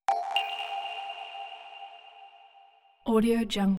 digital ping mallet

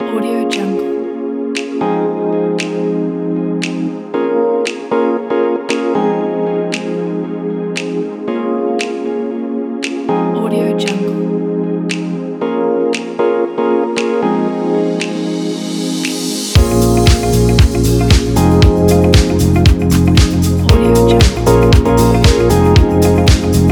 luxury fashion boutique

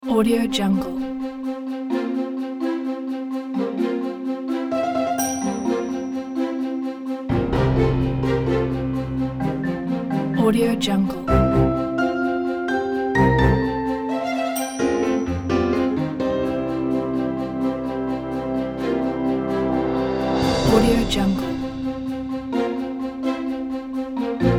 hip and modern intro

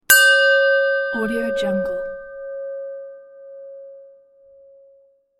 bell ding loud

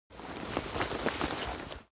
cloth leather metal handling short; foley; smartsoundfx

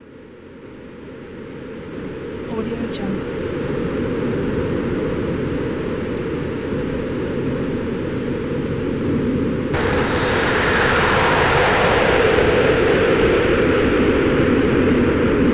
scifi atmosphere

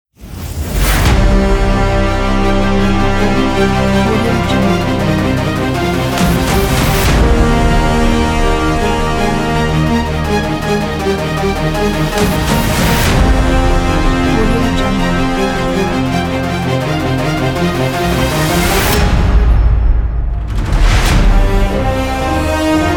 heroic epic ident

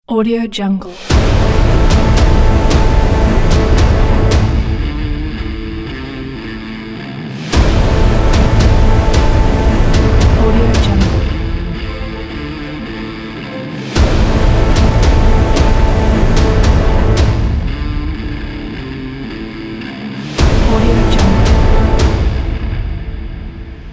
epic blockbuster

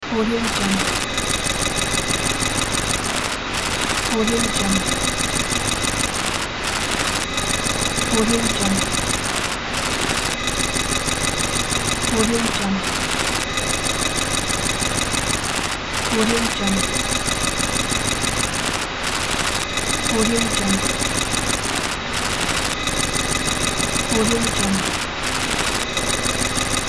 soundscape scifi machinery

